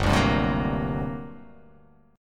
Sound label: G Minor Major 11th, G; GmM11 strummed; 3,5,5,5,6,5,x,x; JGuitar